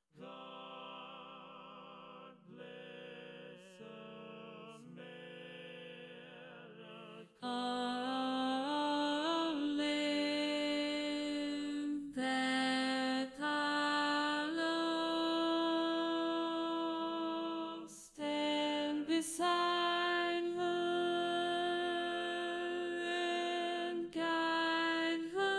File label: soprano